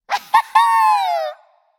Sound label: yay; discordia